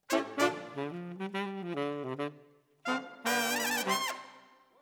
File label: Sax